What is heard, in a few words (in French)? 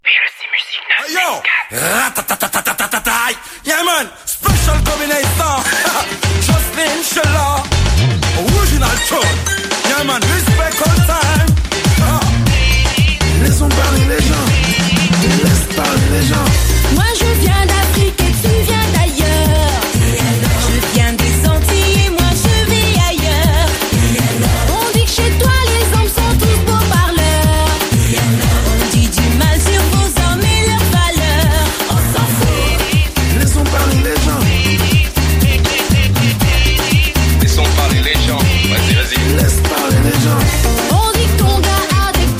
MIX AMBIANCE ANCIENNE